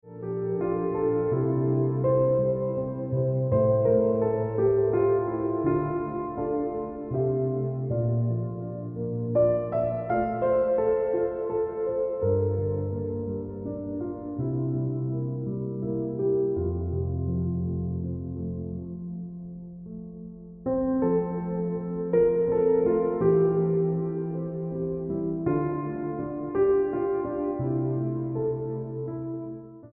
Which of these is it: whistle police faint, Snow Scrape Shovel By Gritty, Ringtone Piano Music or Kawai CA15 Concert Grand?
Ringtone Piano Music